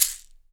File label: CAXIXI, SHAKERS; Big Fish Audio; Ross Garfield Drums VOL-2